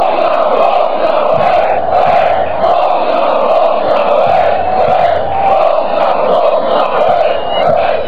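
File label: gol dynamo, football chant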